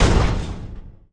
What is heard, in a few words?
moving wall impact into place; Hollow Knight